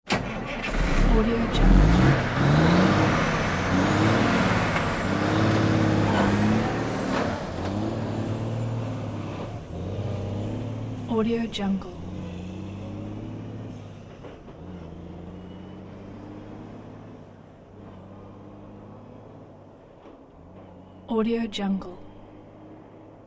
transport truck cab starts and pulls away

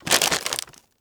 package pick up

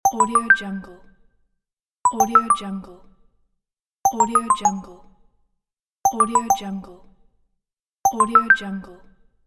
simple notification

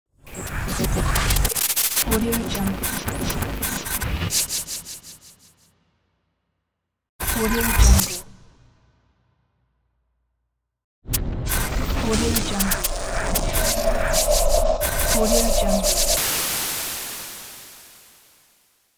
glitch logo

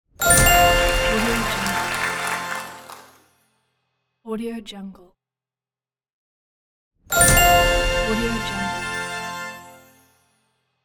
big win fanfare; applehillstudios

